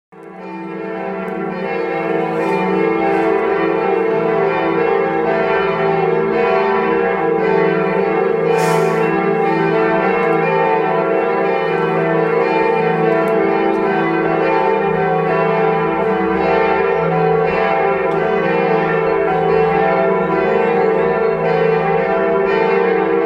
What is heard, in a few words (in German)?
Intro mit Glocken; 2020 Ostern; Aachen; Auferstehungskirche; Corona